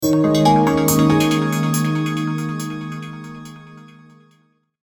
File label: Audio logo